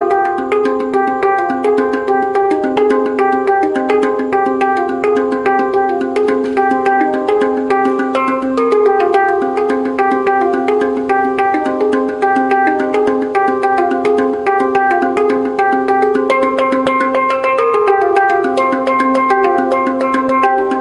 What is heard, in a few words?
handpan